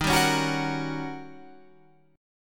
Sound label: D sharp 7th Sharp 9th Guitar Open F; strummed; JGuitar